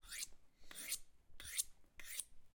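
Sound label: knife sharpen, interior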